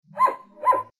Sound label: dog